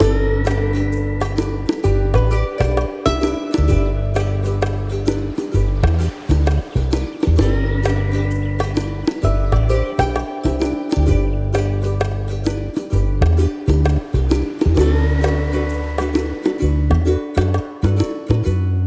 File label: Base Music Loop; Tiki Mania